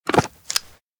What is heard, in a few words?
helmet wear; anemoi